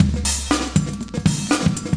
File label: 120bpm loops; Sound Effect Library